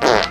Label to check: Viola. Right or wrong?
Wrong